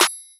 Claps

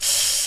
Percs

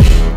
Hit

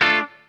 GUIT

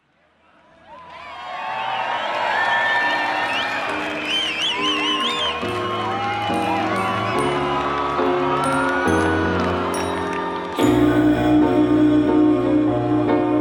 Instrumental

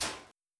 Closed Hat

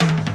Closed Hats

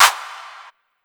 Reverb Clap; Claps

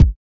kicks

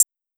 hat, closed hats

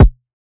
Kick - B; Cymatics - Astro Talk

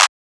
Claps

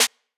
Snares

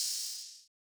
OH, Open Hats

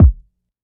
Kicks

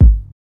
Kick

